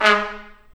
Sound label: BRS TP, Roland, Stabs; Roland L-CDX-03